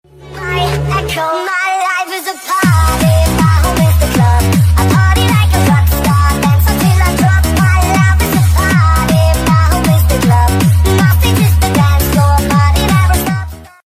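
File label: dubstep music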